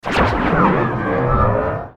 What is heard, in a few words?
Blurp Electronic Warble; Warped Sound Effects